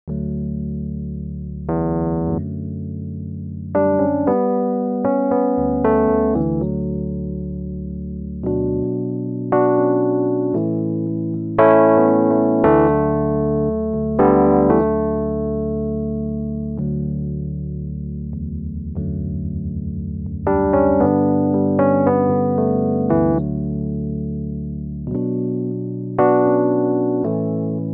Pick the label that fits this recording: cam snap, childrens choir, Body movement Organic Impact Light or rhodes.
rhodes